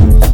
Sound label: PERC FX, Big Beat; Big Beat Samples & More; AKAI